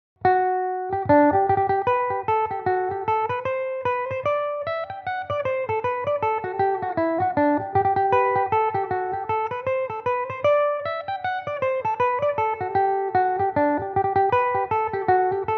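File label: G major belmuse, irish mandolin; Belmuse EM-190 electric mandolin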